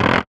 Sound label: Percussive Hit, contact mic